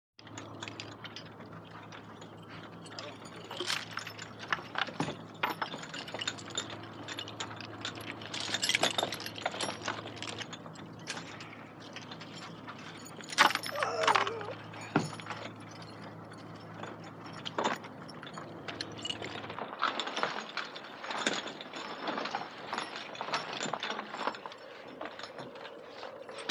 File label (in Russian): V kandalah raby